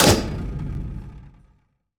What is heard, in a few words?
UI Menu UI ONESHOT; Monster Jam Steel Titans